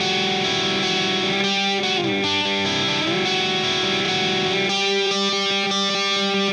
Silos Lead Guitar Riff, Indie Rock; Sonic Foundry (Sony Creative Software); Indie Rock Anthems; Session 11 - Silos

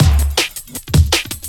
Swing Cut, drum loop, jungle, 160.5; Zero-G Jungle Warfare 1, 2 & 3

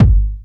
BD, Drums Dance & Groove

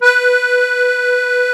HOHNER, akkordeon

